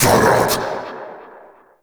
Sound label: Cast Vocal; Uriel Brimstone; Warframe